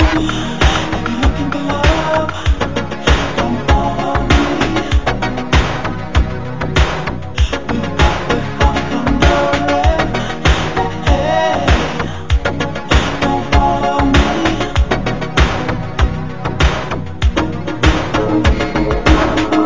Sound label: muzak